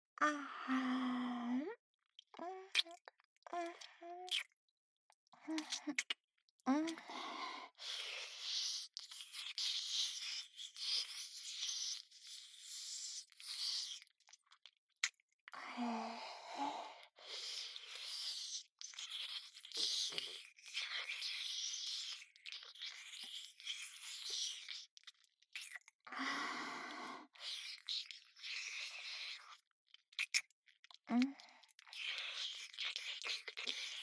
乳首舐め; 逆NTR; 爆乳兄嫁; ノーブラ誘惑

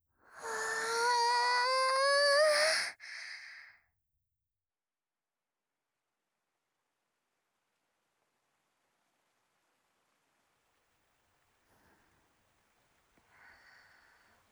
水と土とのハーモニー：おんた皿山の唐臼の音; 蓄音レヱル ノワール

